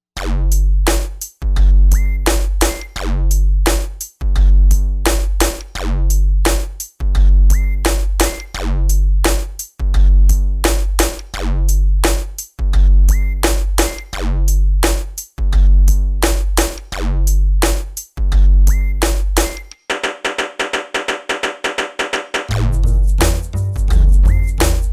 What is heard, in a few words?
HDGLITCH, AKAI XR20 Factory patterns